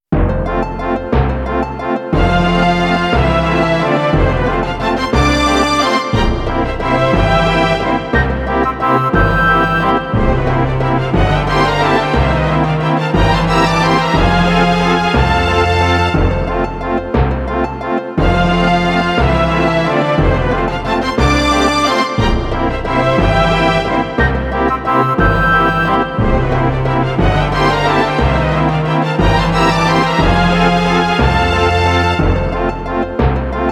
Waltz; SkyGunner

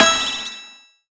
Countdown Go

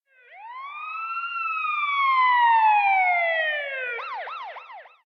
Police Siren; City

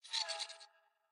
Ring move; miside demo windows gamerip 2023

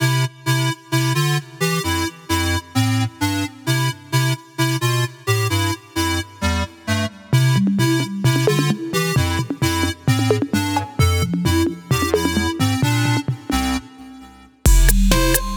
Kit-Core808; Super Phantom Cat soundtrack 2019

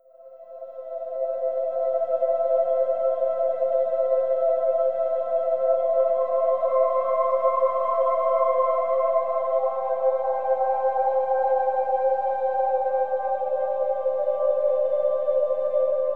water; omori

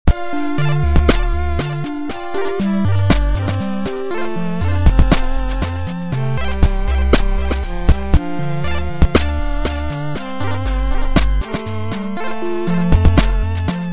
song; Robot Wants Fishy

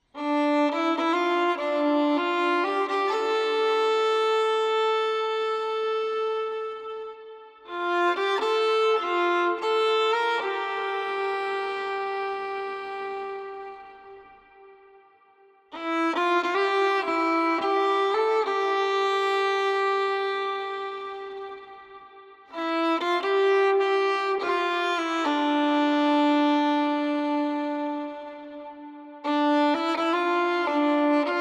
Lullaby; bramble the mountain king; ps4 ps5 switch windows xbox one xbox series xs

